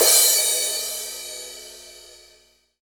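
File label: cymbal crash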